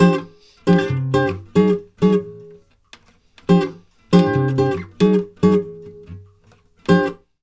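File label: 140 guitar F7, brazil bossa; USB Soundscan; AKAI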